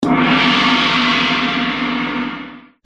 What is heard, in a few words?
Gong